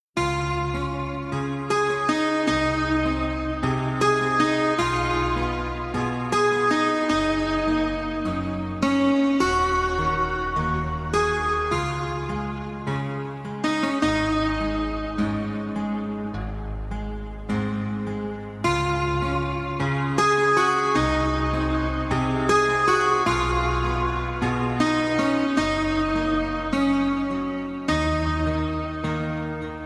Romantic Ringtone

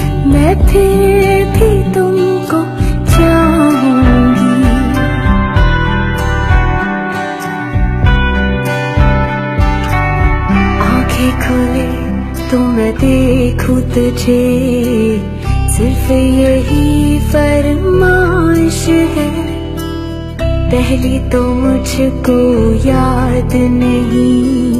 Ringtone Female Version

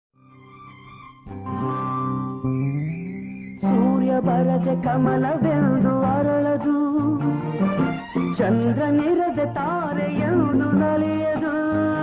Old Kannada Song Ringtone

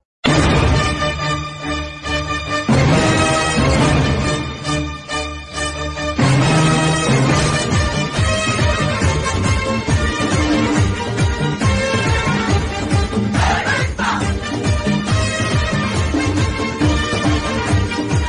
Music Tamil Bgm Ringtone; Padayappa

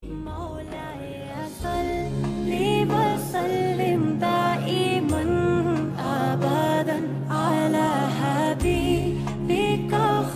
Female Ringtone

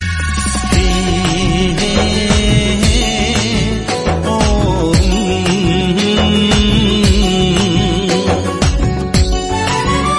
Ringtone Odia

